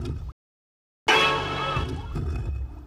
Lately Stab, Musical FX; Zero-G Smoke

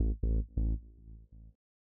Bass 2 Riff A, Belgium Beats 132bpm; Big Fish Audio - Old Skool House; OSH Construction Kits

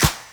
SD, Dance Mega Drums; Best Service

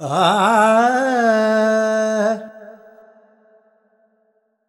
Voice, Vocals Male, World Pop; Sonic Foundry (Sony Creative Software)

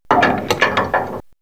Chains; X-Static Goldmine

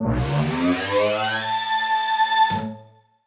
Siren Horn Type Hits Ramp Down A; ProfMash; future rave

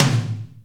Mid Tom; Zoom RT-234; Kit A

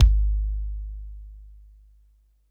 Korg 01W MegaDrums; 300 Drum Machines

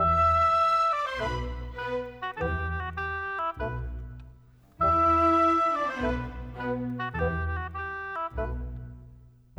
Orchestra

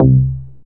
Synth Bass, Roland

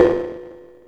KWERK BLIP; Zero-G Ambient 1; ANABELL PERC

